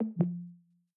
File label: Pairing Failure; Peloton